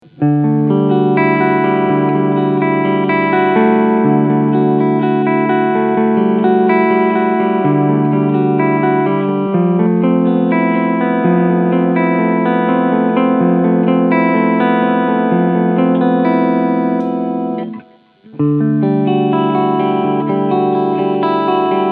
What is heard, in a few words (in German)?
Harley Benton EX 76 Classic GHW AN Overdrive Cleansounds alle PUs